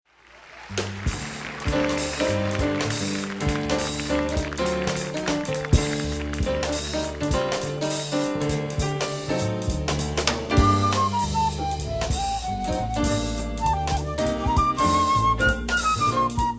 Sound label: lullaby